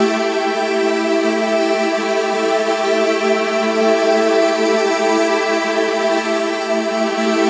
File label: Atmospheric Loop Cycle Amin 128, Melodic Techno; Ghosthack; AC2024 - Day 12 - Melodic Techno